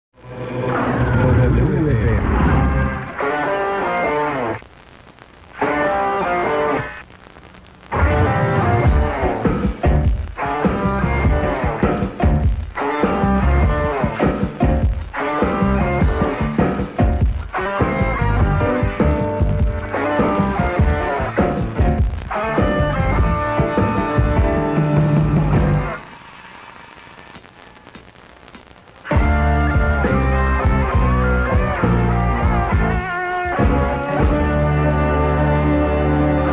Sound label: Jingle, radio